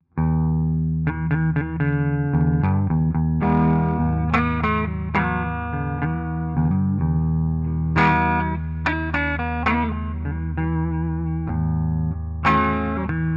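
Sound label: clean rock neck, guitare; son légèrement patiné, ni trop cristallin, ni trop vintage; indie totalnatural; Les sons clairs sur les positions centre et manche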